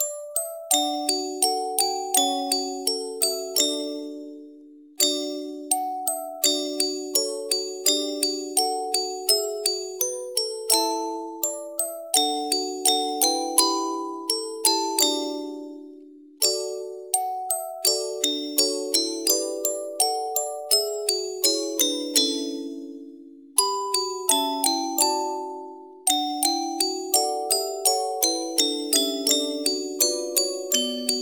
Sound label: golden music box